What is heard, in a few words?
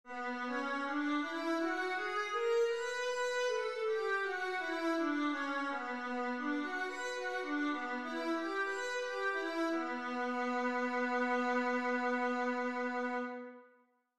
viola B minor; scales